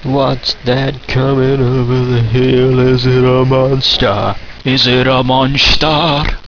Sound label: monster